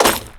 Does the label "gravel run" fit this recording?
Yes